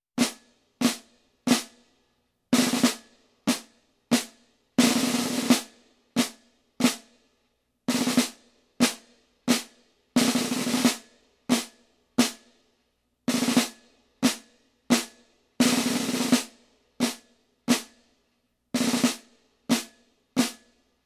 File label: Tight Marching Snare Rolls and Rhythm